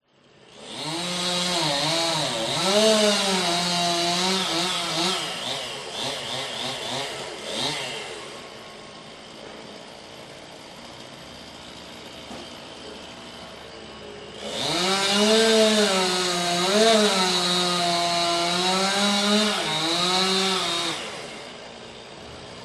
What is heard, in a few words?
industrial chainsaws x2 cutting trees med distance light birds